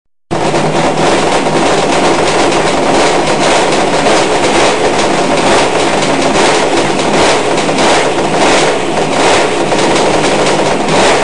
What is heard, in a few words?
RG screamer